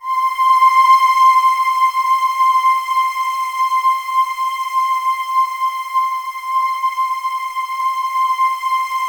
C6, Synth Leads; 90 s Sample CDs; NiceBeats (NiceBytes); Studioline 09

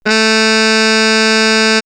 mute trumpet, horn section; X-Static Goldmine; backtothe70's

